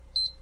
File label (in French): bruit de la ps4 qui s allume